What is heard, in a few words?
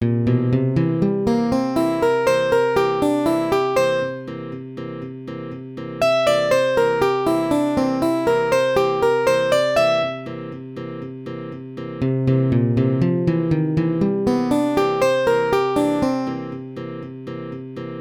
C7 licks arpeggios; gipsy jazz